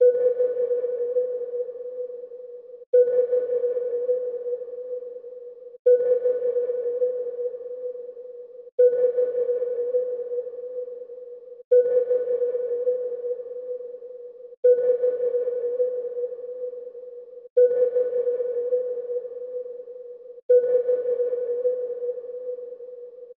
Ambience, 164 BPM B Min; MoogOne; Cymatics; Horoscopes

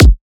Kick, Drums; One-Shots; Kicks; Ghosthack; Color Bass Essentials

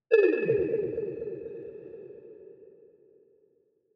FX Down Redux

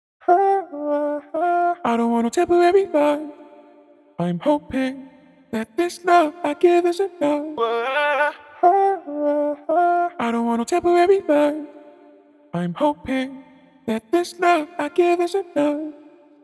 Vocal Loop 123 BPM G Min; Tempo; Cymatics; ANALOG EVOLUTION Beta

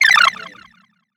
Collectibles Sci Fi FX; Video Game; Cymatics; Video Game Sample Pack Vol 1

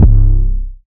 808 - C; Devastate; 808s; Cymatics; 2022 Melody Mystery Expansion

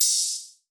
Ding Open Hihat; Cymbals

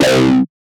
Techno Synth Shot C; 45 Techno Synth Shots 2025; Synth One-Shots

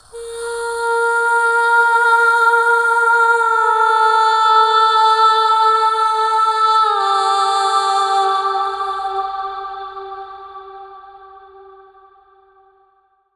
Main Vocals - Cm 70bpm (WET); Construction Kits; Ghosthack; SkyRealm; Kit 19 - Ode To Daenerys

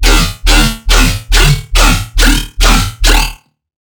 Riddim Bass Hit D#; Cobra

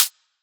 Close Hi Hat, dubstep; Drum Shots; GhostHack; Demolition; Venomous

